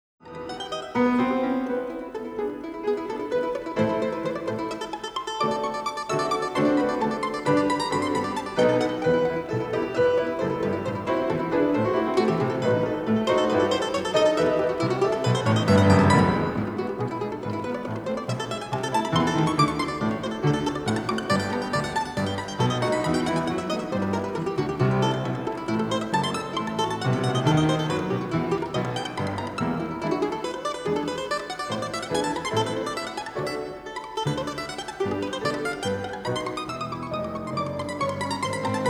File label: Domra